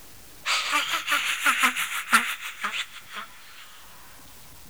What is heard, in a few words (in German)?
lacht